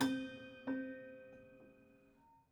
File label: Prepared Piano